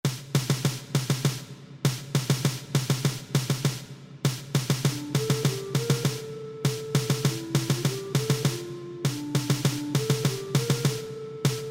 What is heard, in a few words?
PERC; Niño Manuelito